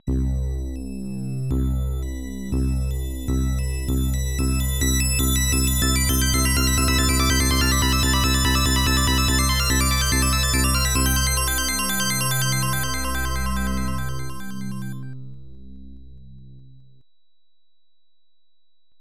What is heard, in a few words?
Breaking the Seal; Etoile Princesse; X68000